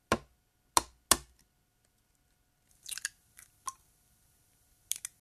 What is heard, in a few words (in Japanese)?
egg